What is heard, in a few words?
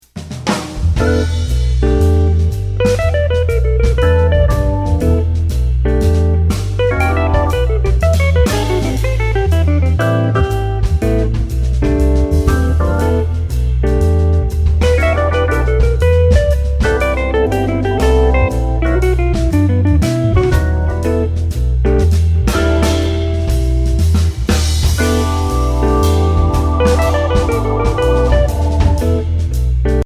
Major And Minor Pentatonic Arpeggios